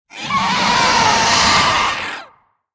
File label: scream air